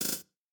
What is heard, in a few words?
breakbeat